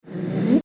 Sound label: Soft Round The Edges Whoosh; Multimedia Fx